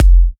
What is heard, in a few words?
F, kicks; markus hakala kicks